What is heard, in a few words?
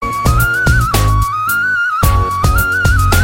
Sms Tone